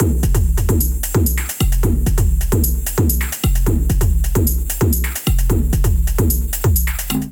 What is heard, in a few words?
Kit Melodic 131 bpm; MIDI Clips; Drums; Jive Kit; Ableton; Factory Packs; Skitter and Step